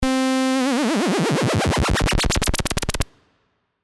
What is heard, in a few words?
vib